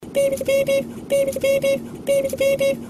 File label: Beepity Beep Beep